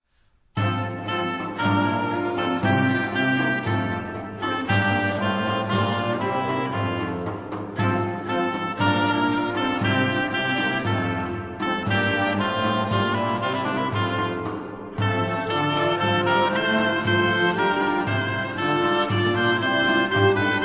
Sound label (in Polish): hejnal